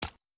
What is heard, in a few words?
UI General Click; bansonic; BANSONIC SFX